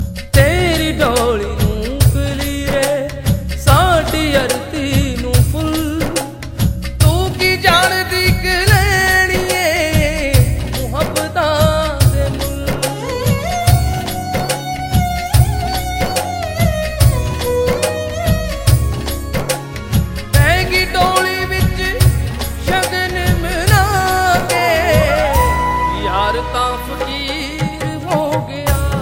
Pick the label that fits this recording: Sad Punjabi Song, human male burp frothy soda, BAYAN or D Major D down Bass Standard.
Sad Punjabi Song